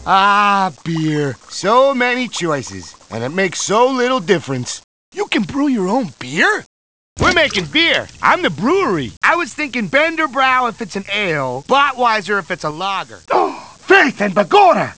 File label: chip 8bit